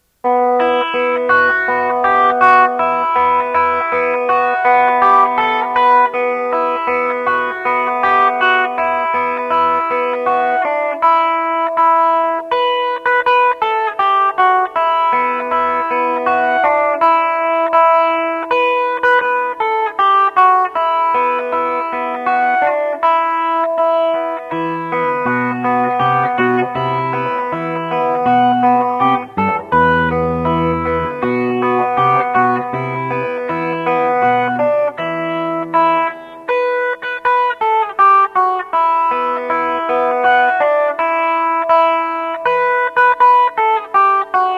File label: Chorus